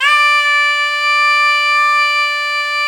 MIZMAR D4

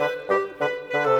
Bassoon Clarinet, Orchestral; Sonic Foundry (Sony Creative Software); Orchestral VOL-2

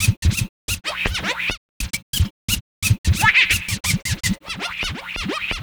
DJ Work; Sonic Foundry (Sony Creative Software); Stylus Pressure Urban Grooves on Digital Wax; Wickey Wah

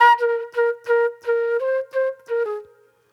Wild Flute; Sonic Foundry (Sony Creative Software); Manhattan Flutes

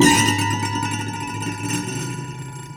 Prepared Piano; Battery 3 Sample Kits

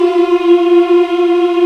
African Mm p; Roland LCDP11 Africa VOL-1

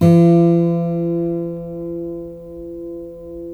GUILD 12 F#3, 12-STR; Spectrasonics; Hans Zimmer Guitars VOL-1

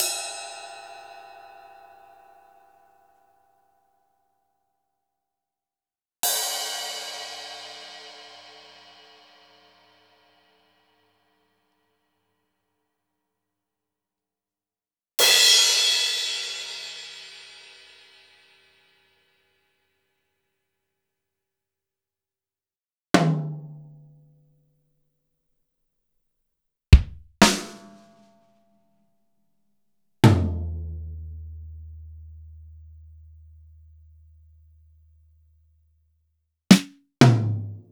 Akai MPC500 Funk Set

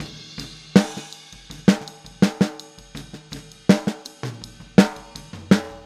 Boogaloo Fill Snare, 82 BPM, Drums

